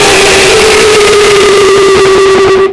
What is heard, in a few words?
jumpscare sound